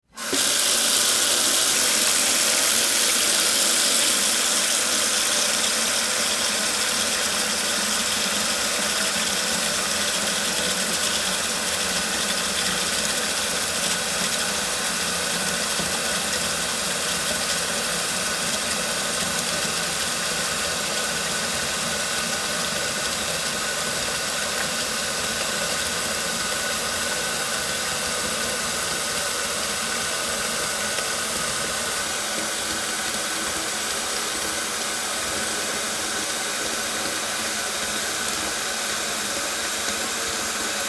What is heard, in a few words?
Sink Fill